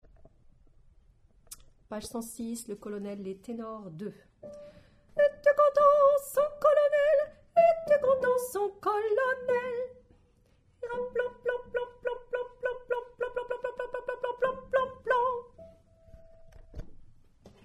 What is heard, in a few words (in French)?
Tenor; La vie parisienne - p106